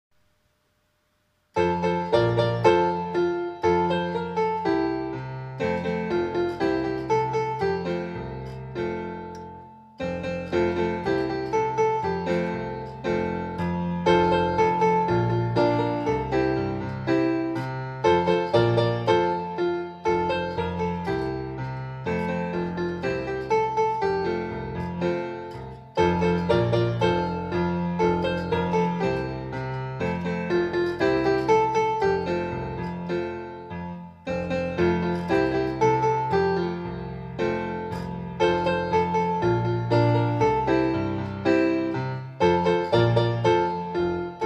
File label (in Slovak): klavír